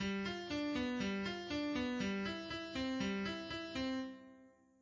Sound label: arpeggio